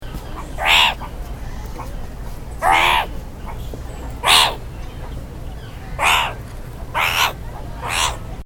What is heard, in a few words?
meerkat bark sound; animal sounds